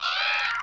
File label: CR CAW birds; Giants